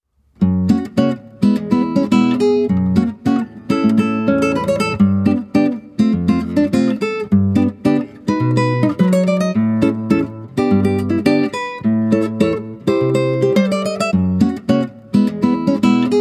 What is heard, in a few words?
Giant Background Music, guitar; New Super Mario Bros.; super mario series for guitar 2010